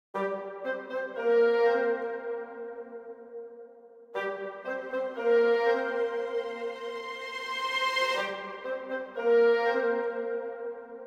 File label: (Orchestra); B Path of the Teal Lotus; Crimson Bamboo Forest